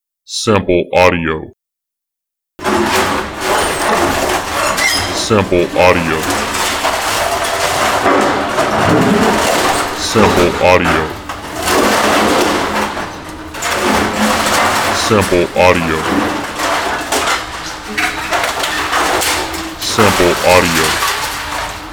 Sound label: Metal Destruction